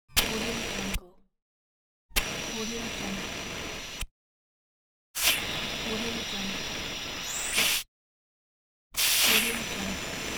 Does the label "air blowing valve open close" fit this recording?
Yes